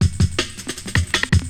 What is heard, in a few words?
DRUMLOOP D+B 160, Drum+Bass Loop; eJay